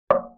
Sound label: Barrel Hit Soft, Vintage Cartoon; Drums & Percussion